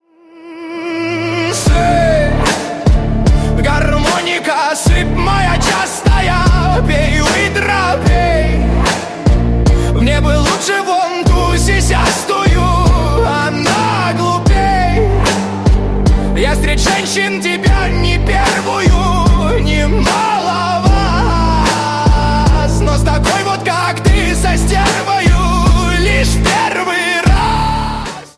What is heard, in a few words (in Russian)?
garmonika